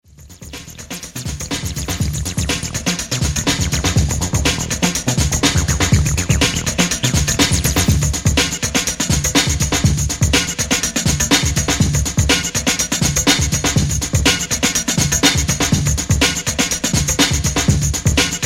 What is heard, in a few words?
Beat